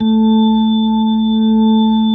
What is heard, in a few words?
A3S, B3